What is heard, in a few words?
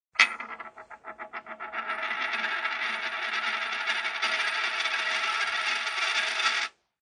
Spinning a coin on flat surface; Clinks; Objects